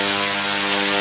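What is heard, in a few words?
Electric Buzz loop; Puddle; puddle plus ios gamerip 2018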